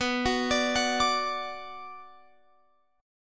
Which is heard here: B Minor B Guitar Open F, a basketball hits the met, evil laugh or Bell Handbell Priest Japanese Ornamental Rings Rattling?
B Minor B Guitar Open F